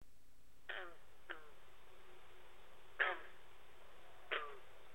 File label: green frog